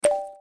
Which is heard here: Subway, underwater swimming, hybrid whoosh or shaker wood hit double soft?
Subway